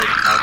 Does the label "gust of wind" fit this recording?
No